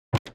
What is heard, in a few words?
reel Stop